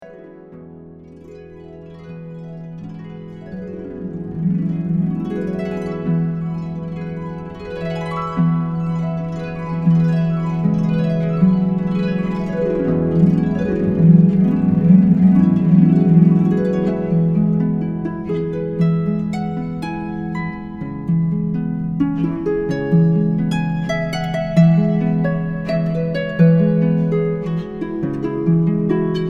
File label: harp